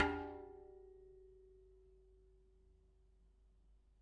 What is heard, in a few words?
Bicycle Spoke